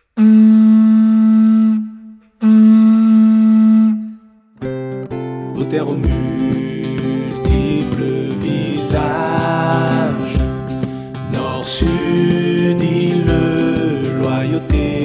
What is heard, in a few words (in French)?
Hymne NC Traditionnel version courte